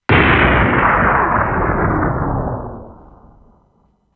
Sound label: Explosion